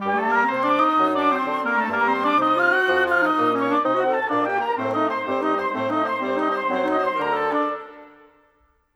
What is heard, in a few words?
Modern Winds, Orchestral; Orchestral2